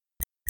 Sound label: pocket watch; Apotheorasis